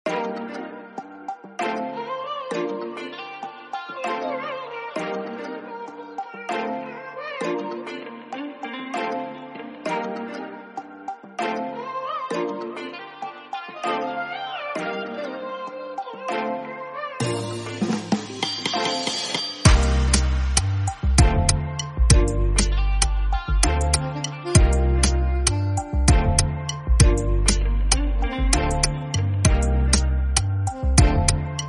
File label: Afrobeat Instrumental